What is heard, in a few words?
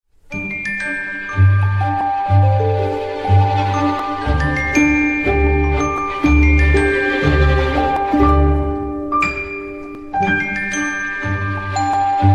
lumia 1020 dewdrop ringtone